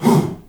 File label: HOO, SHOUT; Spectrasonics; Heart of Africa 1; CH. SHOUT1